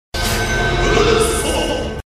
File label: boost